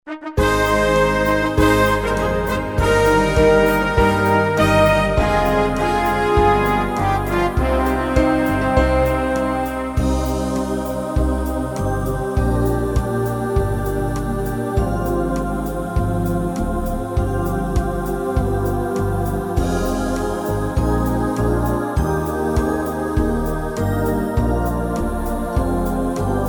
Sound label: anthem minus